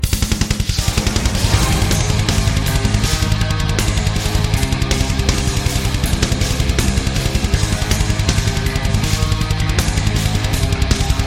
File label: Death Metal